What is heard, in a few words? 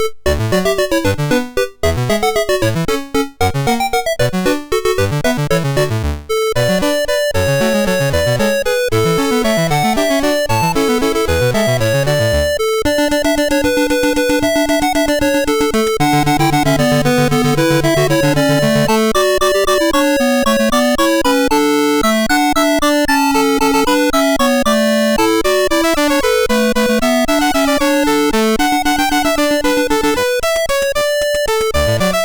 Complete Computer Fireworks Celebration Kit, MOS6581R3